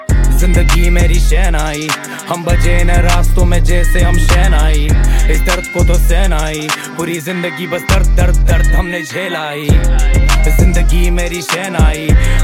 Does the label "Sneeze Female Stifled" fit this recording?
No